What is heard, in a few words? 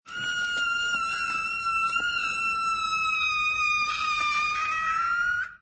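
scream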